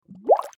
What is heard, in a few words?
Water Bubble Surface Single; Nature